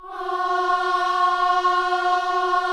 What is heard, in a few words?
KIDS CHOIR, Gospel; Spectrasonics; Vocal Planet